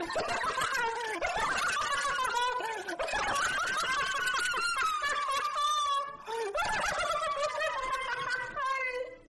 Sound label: insane laughter